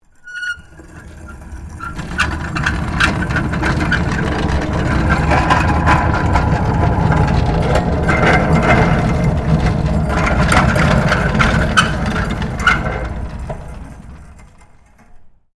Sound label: DUMPSTER LARGE METAL MOVING FAST, Large metal industrial dumpster; Factory Sound Effects; Factories (Sound Effects:Backgrounds)